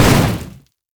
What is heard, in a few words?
missile barrage normal; LoL; corki